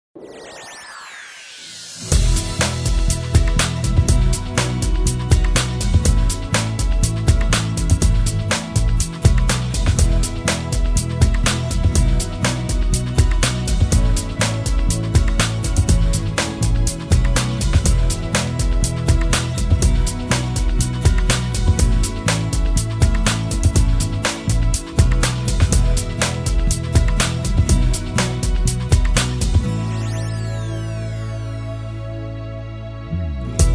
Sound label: Music Sample; On Hold